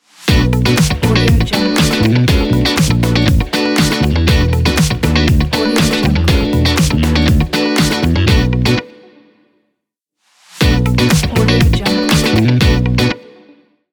modern funk logo

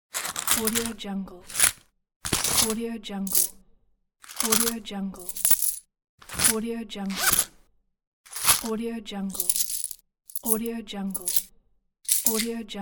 coins handling

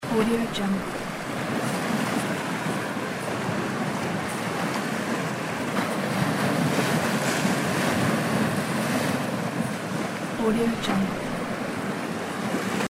sea waves

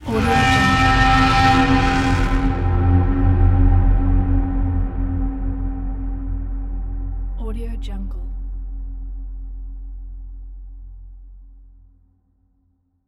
hybrid horn

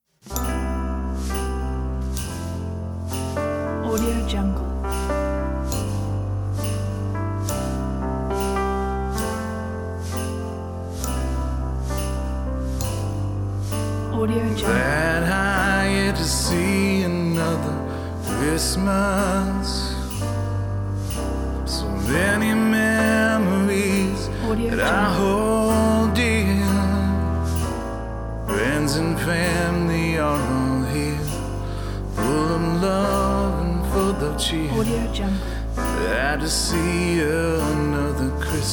jazz christmas piano soulful home bells

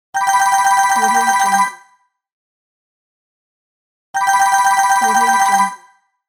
ringing

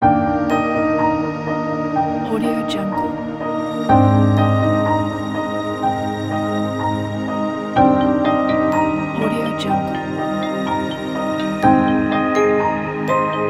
ambient logo ident

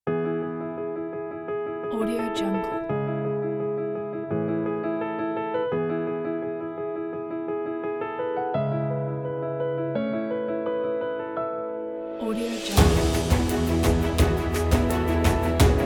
cinematic and motivational pack

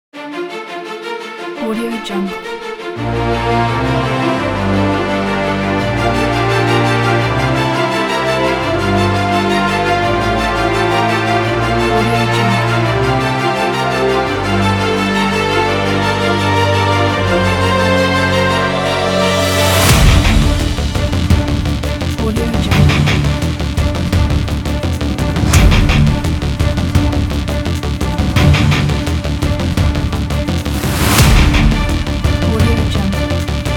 cinematic hybrid trailer; boom-sound

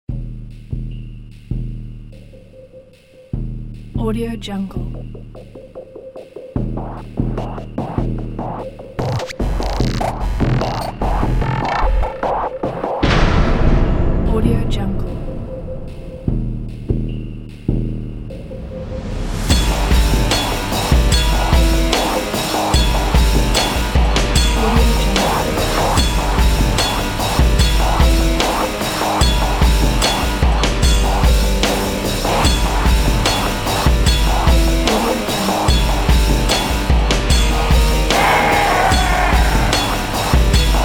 torture chamber

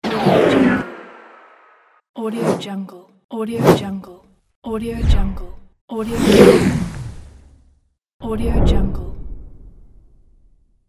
a slide; 3dhome

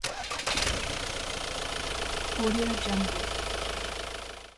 engine start

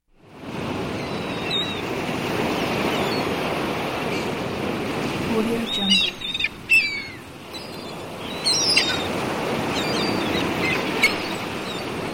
sounds of seagulls and the sea; sounddream

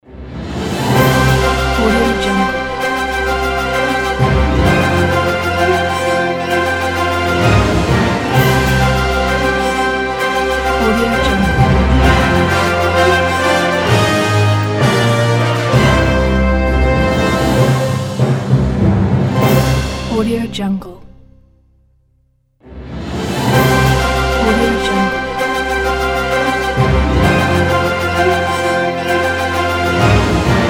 orchestral intro

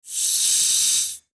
pressurized air release through hose

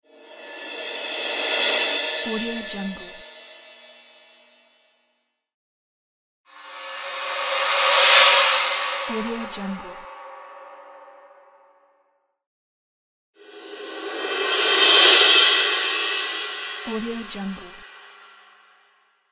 cymbals

